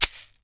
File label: GANZA ACCENT, BRAZIL